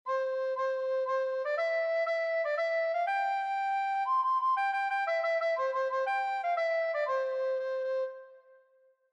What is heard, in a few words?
Row Row Row your Boat Recorder